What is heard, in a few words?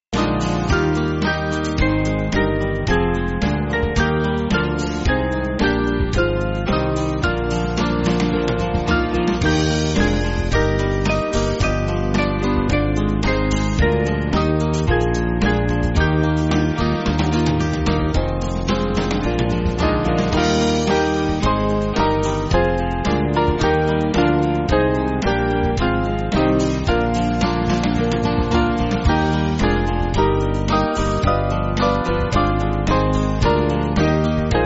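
Band, Music Backing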